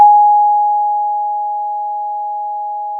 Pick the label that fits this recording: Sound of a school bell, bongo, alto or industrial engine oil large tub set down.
Sound of a school bell